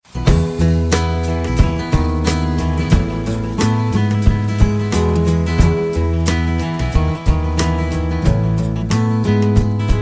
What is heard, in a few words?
pleasant sms tone ringtone